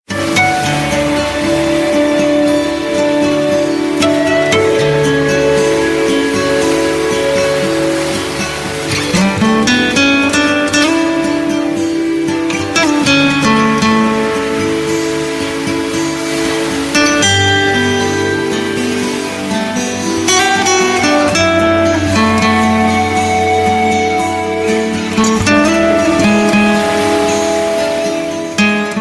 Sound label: Note 2 seaside